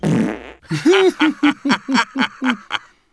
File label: Night Elf Female Raspberry; wowpreklad; czwow; NightElfVocalFemale